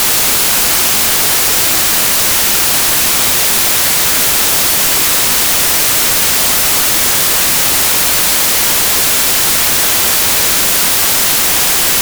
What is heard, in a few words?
white noise 88k -3dBFS; RDacoustic